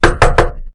join; lix

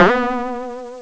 spring; opensurge

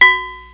Tink; gnustep

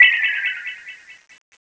collectible loss; opensurge

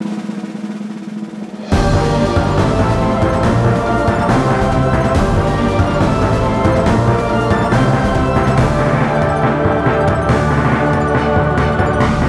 winning; opensurge

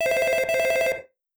Toxic Incoming Call

